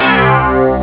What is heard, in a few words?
Poly Synth, Amiga Soundtracker; Amiga Soundtracker Sample Packs